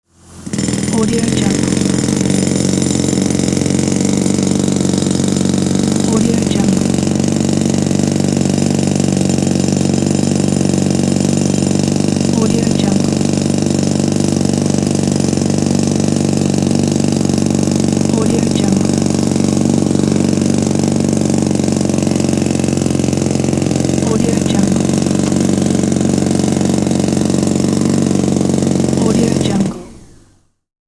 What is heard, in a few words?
jack hammer hammering